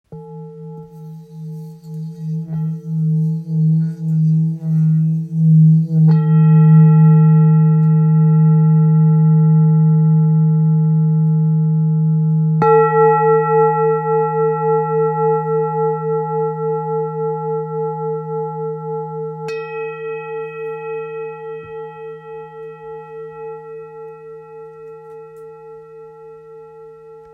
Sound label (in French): Bol ancien la